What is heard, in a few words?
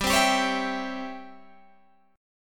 C sharp Minor Major 7th Flat 5th, chord